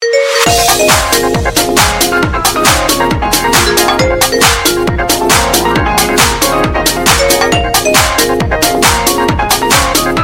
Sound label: Carnaval; LG; C330-Etna